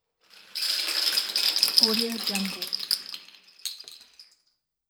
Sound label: plastic caps falls on wooden stairs